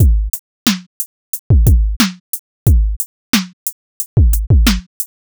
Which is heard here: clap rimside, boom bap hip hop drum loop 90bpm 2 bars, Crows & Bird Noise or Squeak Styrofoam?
boom bap hip hop drum loop 90bpm 2 bars